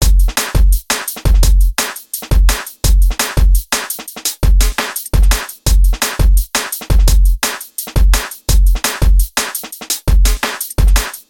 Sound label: Full Beat